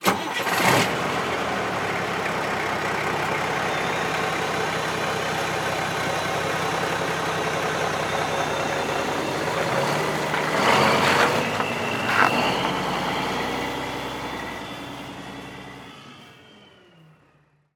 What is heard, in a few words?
Semi Truck; Large Vehicles; Blastwave